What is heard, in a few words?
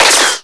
GUN SHOT; AKAI S-Series CD-ROM Sound Library VOL-8; SFX